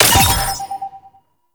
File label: Scan Pillar Burst; Warframe; Frumentarius; Scan Ability